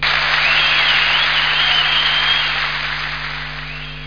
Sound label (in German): beifall